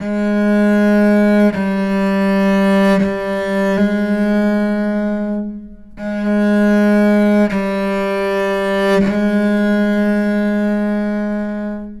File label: 160 strings climax cello mid Fm